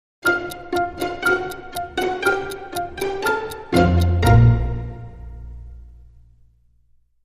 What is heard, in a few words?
Dramedy Sting, Short